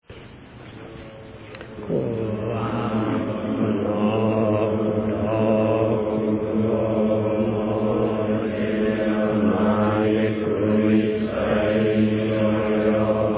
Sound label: end chant bells announce